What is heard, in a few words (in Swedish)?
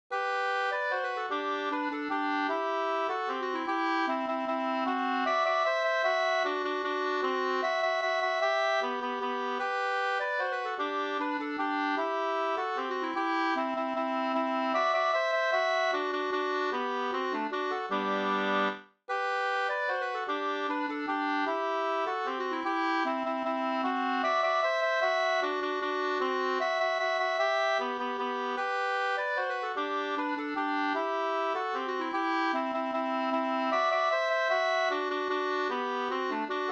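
vals, two-part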